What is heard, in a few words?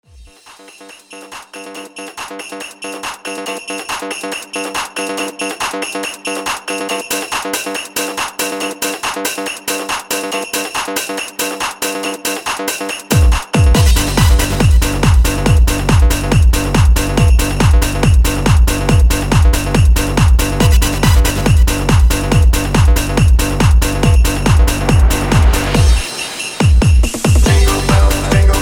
Jingle Bells